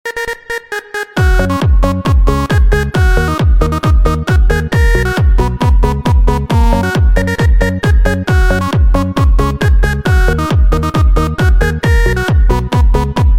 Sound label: Synth; sytrus synth